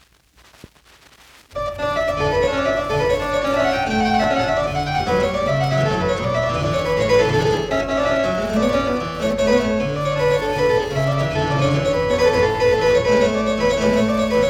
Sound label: Harpsichord Suite; 14th Suite (Le Rossignol-Vainqueur); Francois Couperin